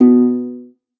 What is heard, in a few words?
lyre